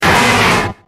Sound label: lapras cry